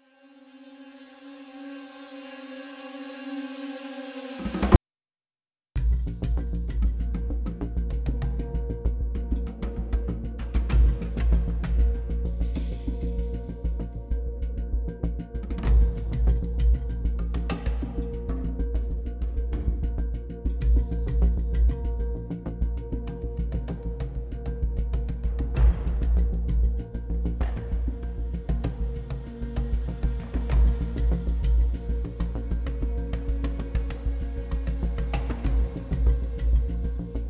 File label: BGM music start; Babylon's Fall